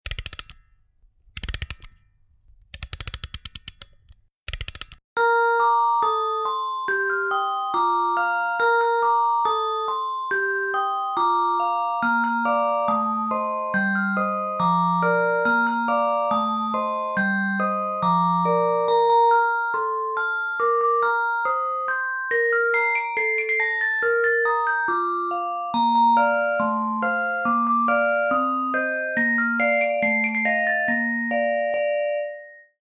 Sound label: Music Box